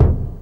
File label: hit, percussion hit; Ueberschall; Techno Trance Essentials; DRUMS